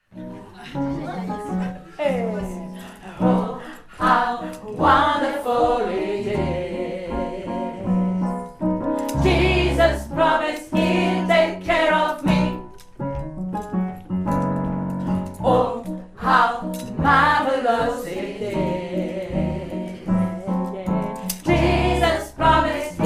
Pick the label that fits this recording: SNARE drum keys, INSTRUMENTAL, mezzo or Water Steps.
mezzo